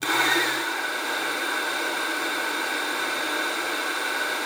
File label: luft, alto sax; Xsample VOL-2